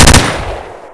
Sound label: m16 burst; brainbread